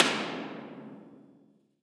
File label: Battery Quarles, Impulse Response, Underground; EchoThief Impulse Response Library